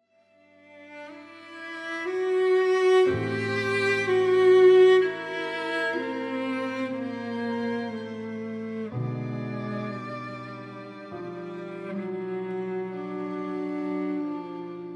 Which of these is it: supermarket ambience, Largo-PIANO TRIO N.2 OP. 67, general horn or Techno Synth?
Largo-PIANO TRIO N.2 OP. 67